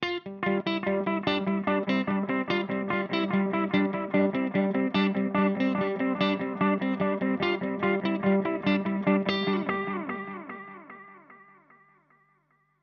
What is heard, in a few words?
Rhythmic Tape Echo, echoplex delay; echoplex delay settings; Meris LVX